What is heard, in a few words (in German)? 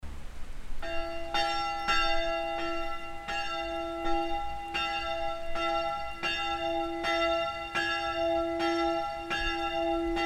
Glocken